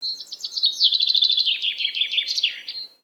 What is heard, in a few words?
new birds, ambient outdoor